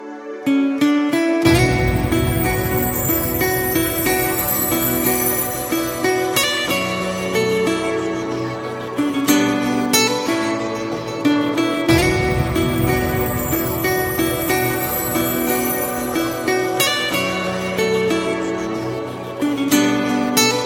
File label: romantic ringtone, bollywood ringtones